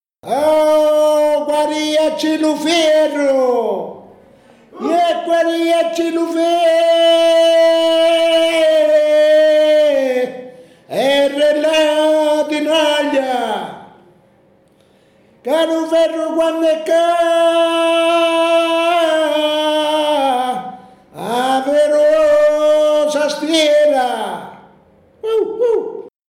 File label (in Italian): Incitamento cantato